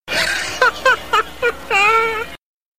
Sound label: Laughs; The Bandit